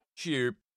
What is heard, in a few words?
Chirp